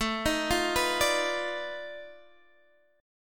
B 7th Sharp 9th Flat 5th, guitar

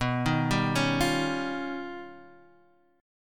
Eb Augmented 9th B

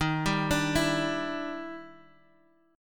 E Minor 7th D sharp; Em7/D#; arpeggiated; JGuitar

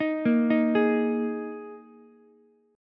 G sharp Suspended 2nd, G sharp, down, Ukulele; G#sus2; arpeggiated; JGuitar

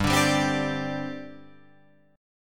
G Suspended 4th G down Guitar Open F; Gsus4 strummed

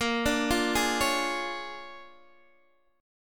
A sharp 7th Sharp 9th, Guitar Open D chord; down

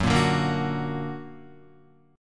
Gb Augmented Guitar; Gb+ strummed

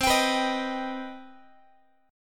C Minor Major 7th, Guitar; strummed; JGuitar

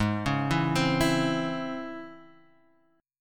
Db Minor Major 7th Ab

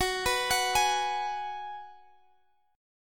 G sharp Minor 7th F sharp

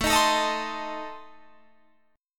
A Diminished A, Guitar Open G; chord

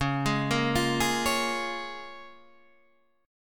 C sharp 6th C sharp down

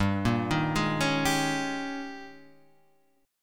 Ab Major 7th Suspended 2nd Suspended 4th G

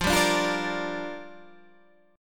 G Minor 7th, F

